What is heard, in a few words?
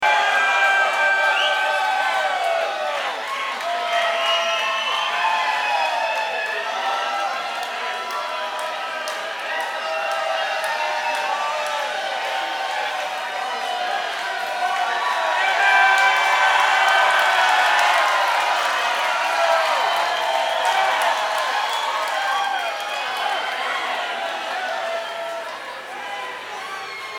Crowd